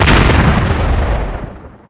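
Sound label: small explosion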